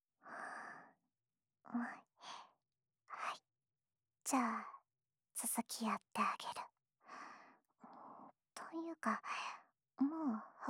お耳 ふーふー、左耳; ほら、いっちばん奥、くすぐってあげる……♪

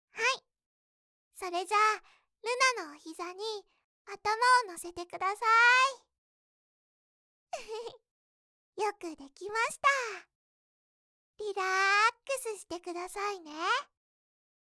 30分間フ〜フ〜されながら左右・両耳かきをされ続ける音声

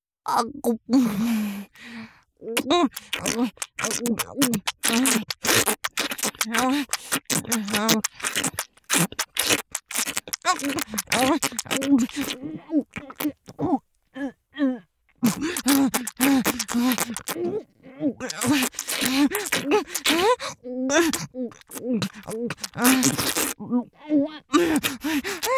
チュパ音, ASMR; チュパ音抜粋集; 頭を上下に振って陰茎を深くしゃぶる

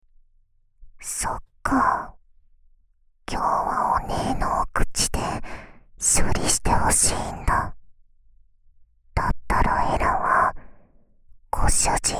よだれたっぷりピストンフェラチオ; ASMR; 姉メイド・メル

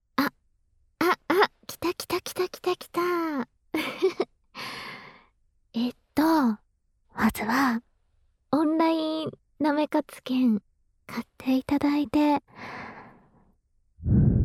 耳舐め; 反転; 伊ヶ崎綾香のオンライン舐め活; 耳舐め編

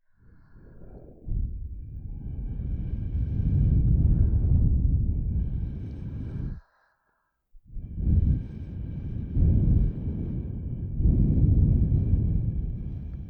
ストローでお耳ふ～ふ～, 両耳

